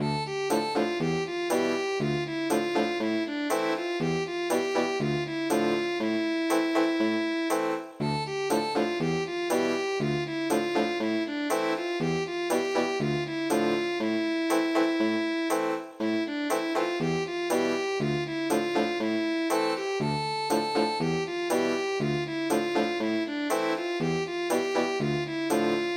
Israel dance Ethnic Guitar

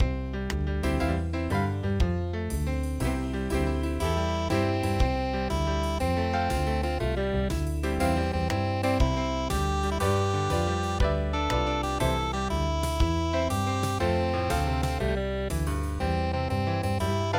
blues; Swing low Sweet Chariot

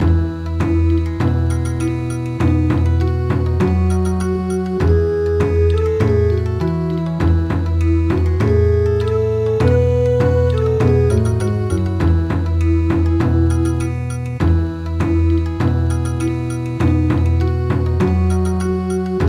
Bon Odori; Happy New Year Greece

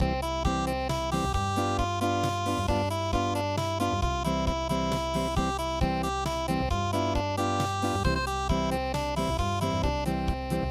Pat A Cake Pat A Cake unpluged

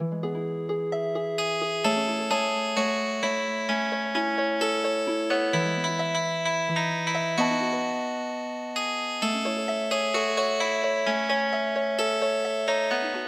Healing Harp; BLUE TAIL FLY

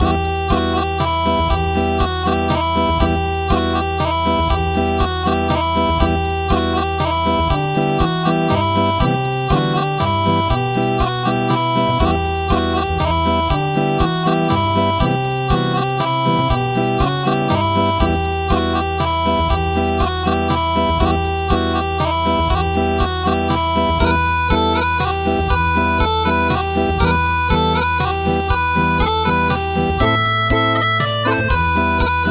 Acoustic; Carol of the Bells

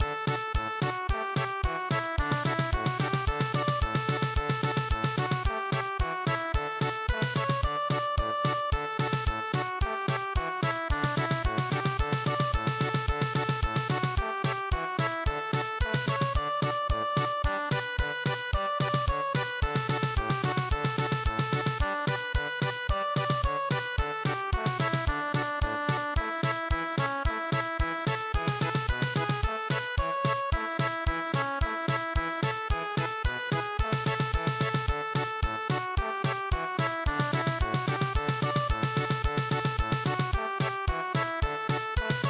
cheerful harvest German Polka

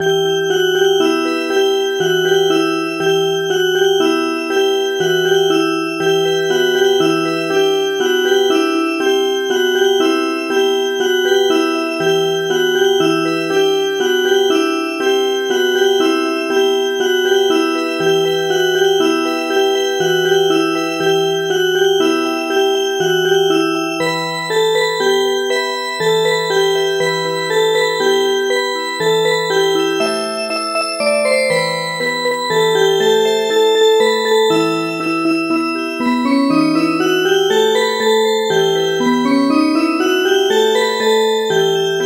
Music Box; Carol of the Bells

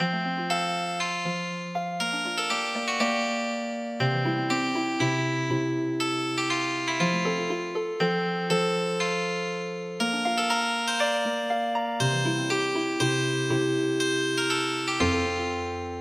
Healing Harp

